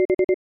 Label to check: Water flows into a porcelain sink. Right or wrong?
Wrong